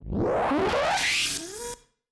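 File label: teleportation machine receive; teleportation machine 01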